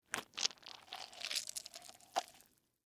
Tear Rip Melon, Texture, Tear, Rip, Melon, Flesh, Fruit; Ripping (Sound Effects:Objects); BlastWave